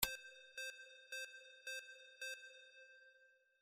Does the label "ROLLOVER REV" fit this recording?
Yes